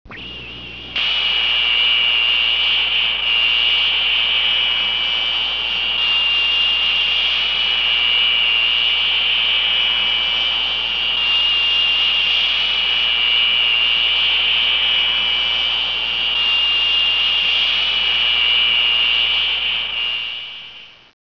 Space Drill; WARLOCKSNDS

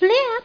Flip!; QulTime4

